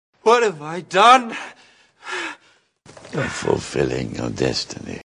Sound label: oppo f9